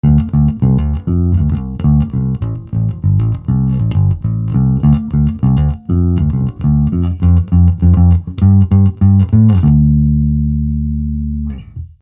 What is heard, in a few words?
Fender Preci